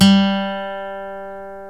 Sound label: GUITAR FLUTE